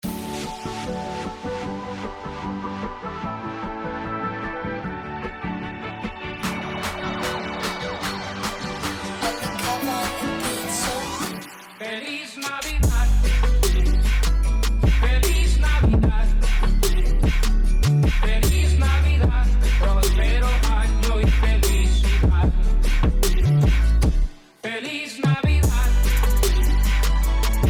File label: Hip Hop Christmas Mix; Holiday Spectacular